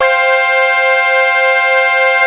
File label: AQUA TARK, Synth